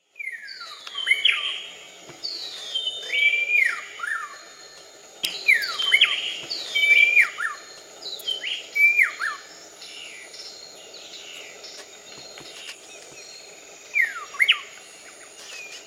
Canto do garrinchao pai avo

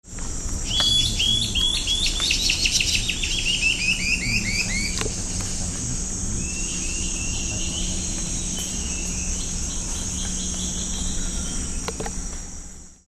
canto da mae de taoca bochechuda

